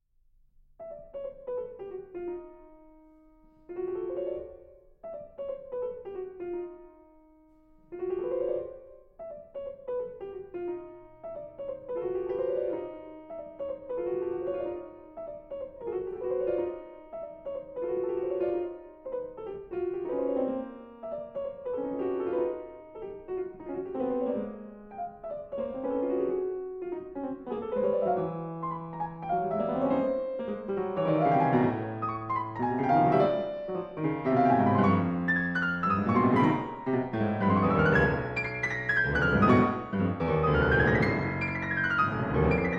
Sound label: piano cycle